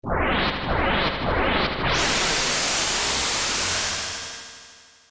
explosion; ff3; ff3us

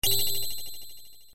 save ting; ff3; ff3us

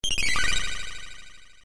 healing sound; ff3; ff3us

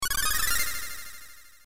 white magic; sfx; ff3; ff3us

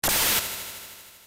sword slash; ff3; ff3us

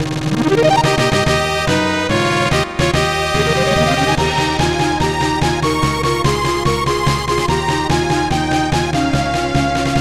victory fanfare; ff3

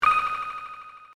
menu ting; ff3; ff3us

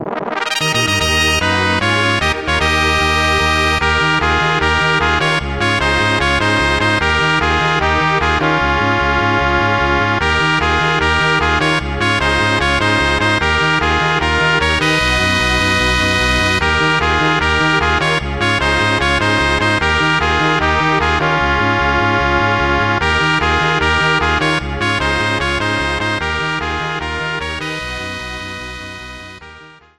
victory fanfare; ff3

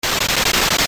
scratch; ff3; ff3us

